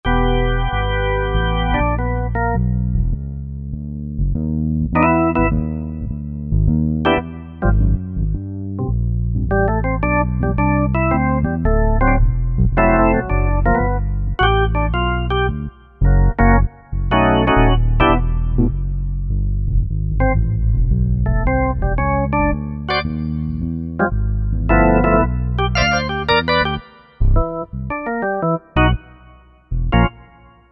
Bass Slow, Korg MSC-9S Organ

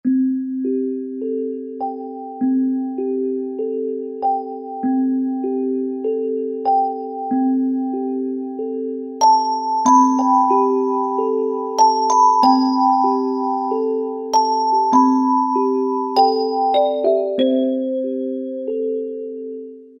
Thumb Chimes; World; Roland SR-JV80-05

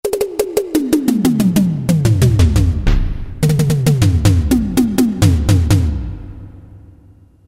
Elec Perc; Roland MT-32

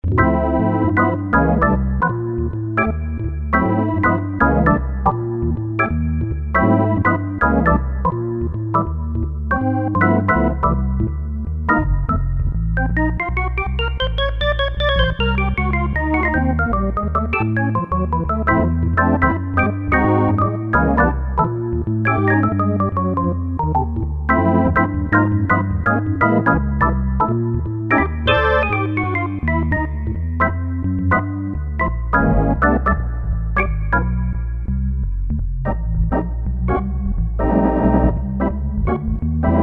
Double Manual, Kurzweil K2000; Kurzweil K2000 V3

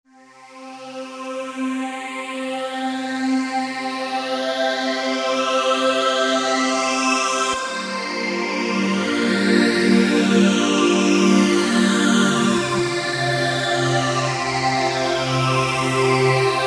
One-hand Pad, Roland V-Synth; Factory Patches

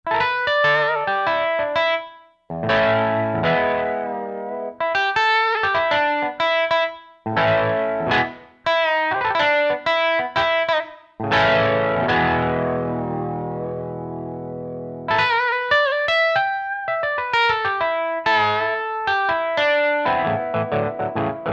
335 Amp + Chrs, Roland SRX-03 Studio SRX

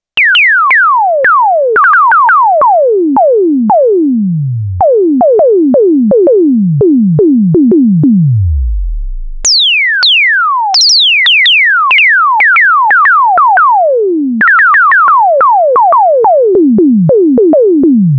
SYNTHE DRUM, Roland SH-101; Factory Patches

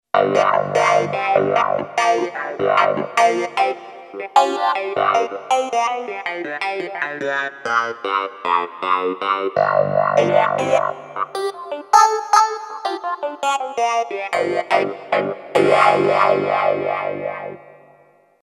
Wah Clavi; Yamaha PSR-550; Voice

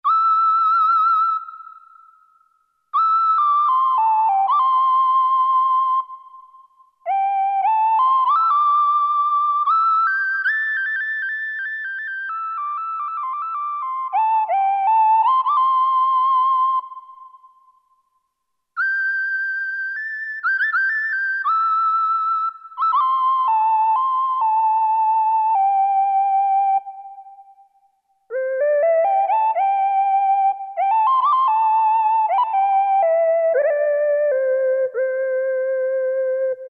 ANA WHISTLE; Akai SG01v

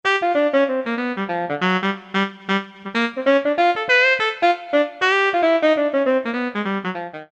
WN AltoSax, Yamaha TG77; Factory Presets

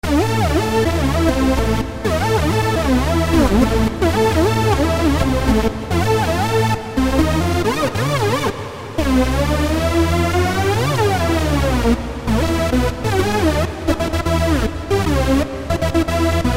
Hoover, Roland MKS-50

